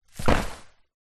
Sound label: wetsuit top drop ground; foley; zapsplat